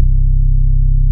MICRO C2, ANALOG BASS; Music Store - Claudius Diemeter Schwerlos

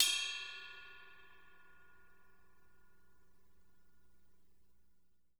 RIDE BELL

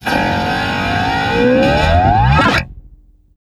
TAKE OFF E A D G E, Bass; EFX

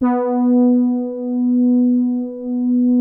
soft brass, poly soft H3; Music Store - Claudius Diemeter Schwerlos

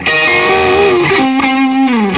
solo C, Vintage Blues Guitar; SOLO C125

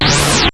TECH KIT; RAVE; Rubber Chicken Software Sample Bank

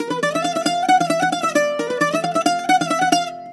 BOUZ PI A#

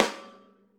RAWSD1V1, Drums Acoustic; USB Soundscan vol.10; AKAI; 90s sample CD; ALL SD

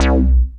BASS C3 L, Jungle; JGL BASS; USB Soundscan vol.05; Explosive Jungle; AKAI; 90s sample CD

